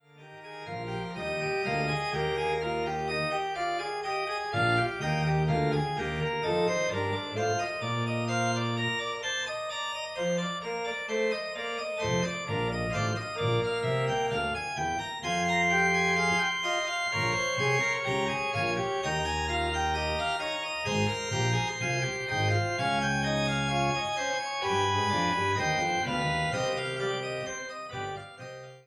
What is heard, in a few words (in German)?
Fuge Probenausschnitt; BWV 542